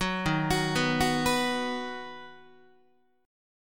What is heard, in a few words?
D sharp Minor Sharp 5th D sharp down Guitar; arpeggiated; D#m#5; 9,11,9,9,10,10; JGuitar